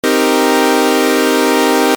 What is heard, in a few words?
C Min 9